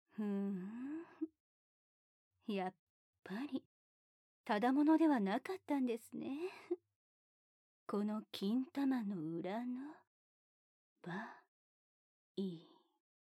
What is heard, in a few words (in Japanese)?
耳舐め有酸素セックス; 効果音なし; ジムトレーナーのお姉さん